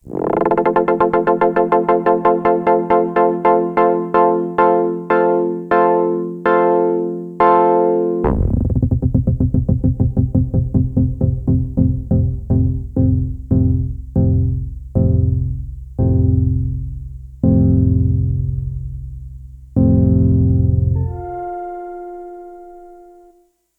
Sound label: Sequential; Sequential Take5